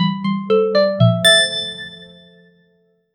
court jingle notes; 2s

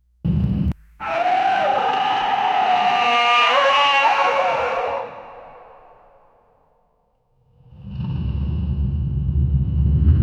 heavy steps of a giant; humanoid

